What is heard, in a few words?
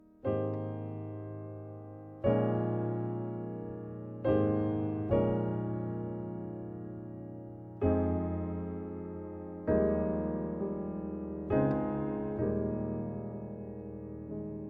Piano